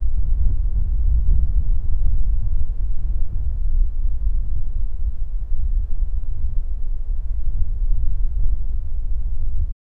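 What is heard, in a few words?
soft warm afternoon ambience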